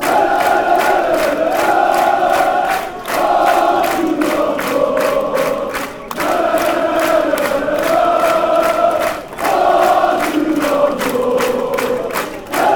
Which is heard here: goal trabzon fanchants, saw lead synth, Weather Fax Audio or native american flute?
goal trabzon fanchants